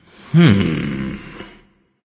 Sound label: thinking; smite; poseidon